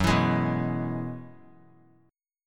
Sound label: Gb 9th Suspended 4th Gb down, chord; Gb9sus4; strummed; JGuitar